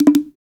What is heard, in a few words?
African Perc